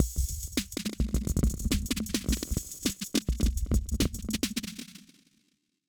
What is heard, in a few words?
Analog Drum Machine graindelay